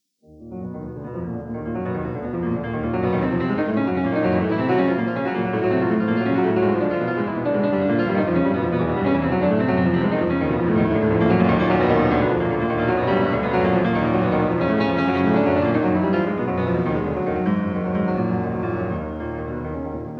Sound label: Prelude in E flat minor; F. Chopin; 24 Preludes, Op 28